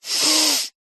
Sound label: human male inhale nasal hard forced slightly blocked; zapsplat